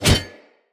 Cleaver Whoosh, Swords; Weapons; Player; Bromius Cleaver

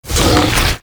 Flying Mouth Attack Inhale End; Enemies; Warframe; ManInTheWall

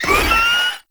Proto Infested Maggot Attack; Warframe

